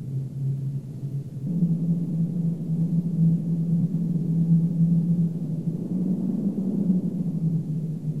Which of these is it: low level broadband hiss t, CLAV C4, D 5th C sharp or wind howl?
wind howl